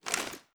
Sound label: ar ads up; gmod; viper